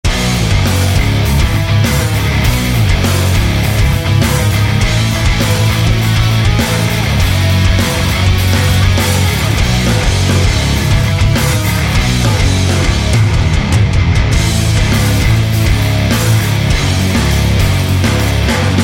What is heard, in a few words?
system guitar